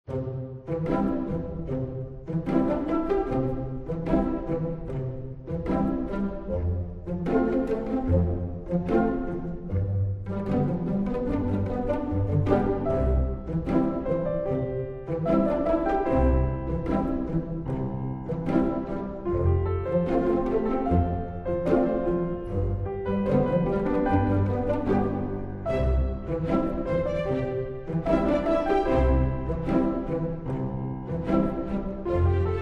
Casual Theme; Dual Blade: Battle of the Female Ninja

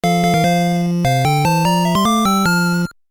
Logos Jingle (Stereo Mix); hack rom gb

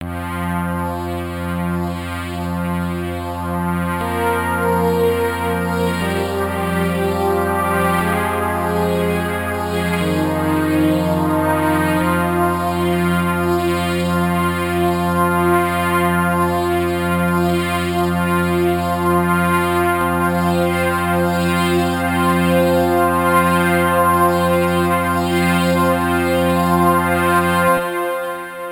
Intro Music

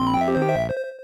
Top 3 highest scores; Letterpillar; Leapster

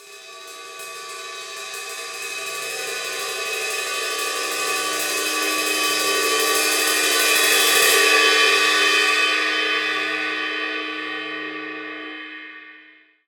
Hi Swell

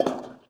Char Fs Sprint Metal; We Happy Few

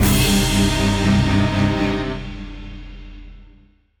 Dramatic Event Bosque

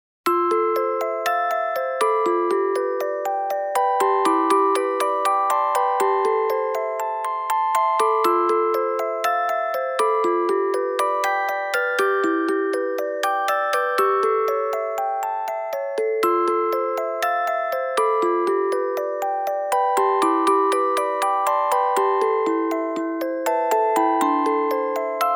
Music Box; To Heart

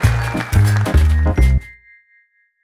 Win Short; Tiana

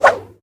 swish

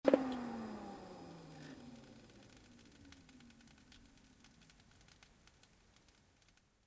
powerdown; Five Nights at Treasure Island 2020 Remake

